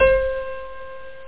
piano high high; OctaMED SoundStudio V1; instruments

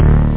reso-bass

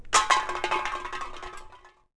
Can KIK; urbanchaos

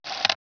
slider; Microsoft Graphics Studio; Home Publishing 99

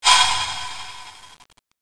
beam Over; POOL CHAMP

